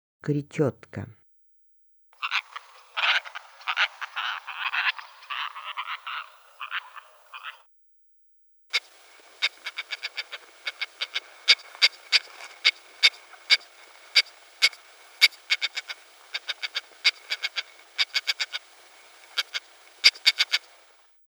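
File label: sociable lapwing voice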